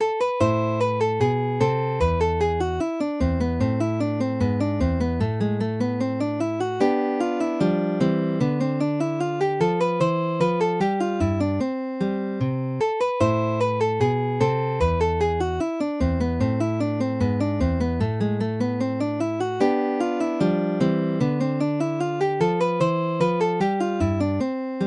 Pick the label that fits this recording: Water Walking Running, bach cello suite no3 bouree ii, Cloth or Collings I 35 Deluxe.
bach cello suite no3 bouree ii